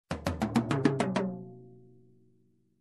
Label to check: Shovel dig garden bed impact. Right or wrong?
Wrong